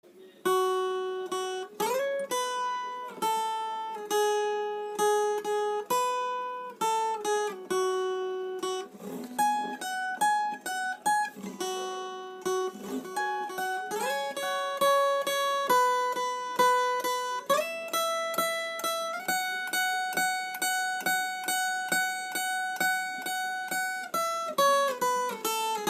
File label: Single String, guitar